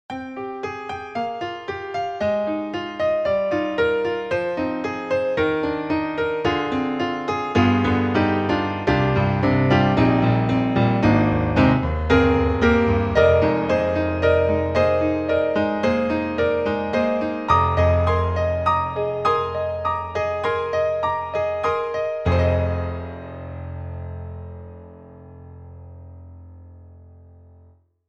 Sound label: YAMAHA A4000 Piano Fazioli; Library for S3000; East Connexion